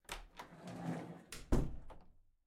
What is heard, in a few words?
Open Door; MiSide